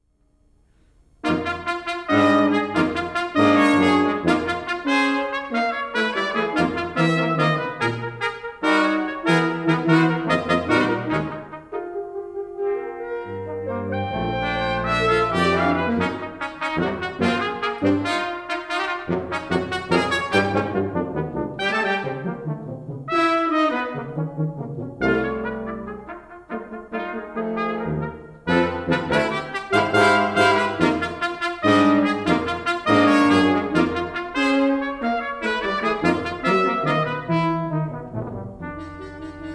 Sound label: Boston Fanfare; Newman